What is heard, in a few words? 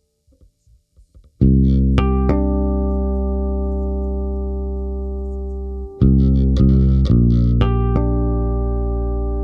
Drum Bass